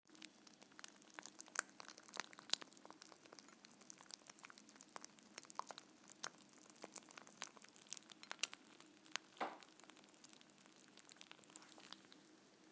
snap crackle pop

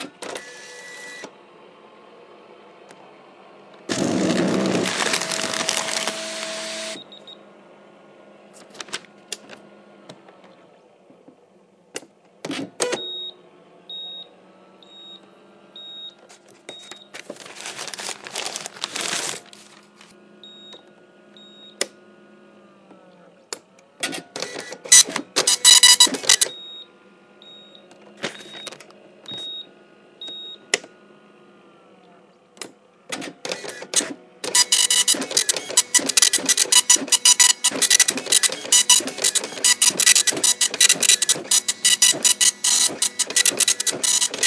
60 seconds of a lovely old dot matrix printer

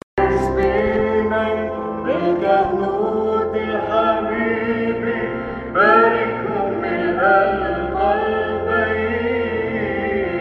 chant during my friend's wedding

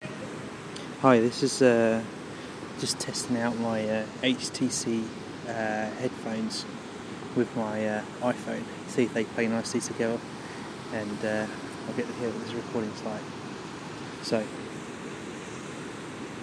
soundcheck

